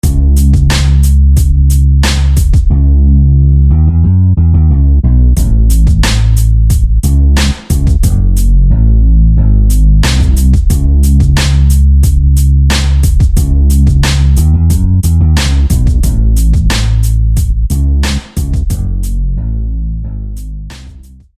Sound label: Bass Dark; SFB FX